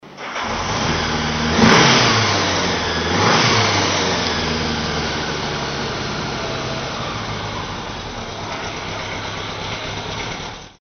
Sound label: 1000 goldwing, motos